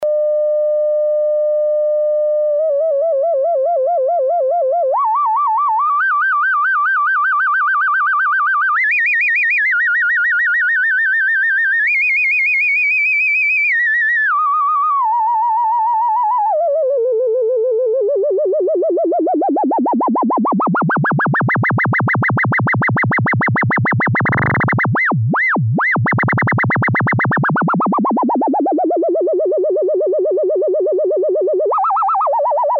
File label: circular wave, Quad lfo